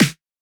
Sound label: Snare, Dance Drum Kit